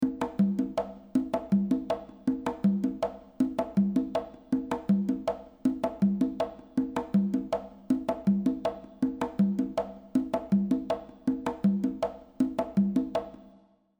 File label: reverb loop Concert Hall; ChromaVerb